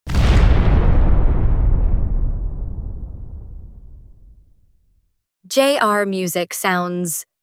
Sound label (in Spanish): impacto